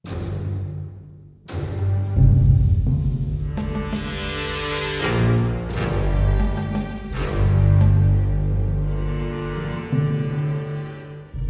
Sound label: Defending Team; Day of Infamy 2016